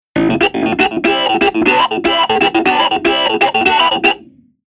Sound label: Keyboard Clavi Wah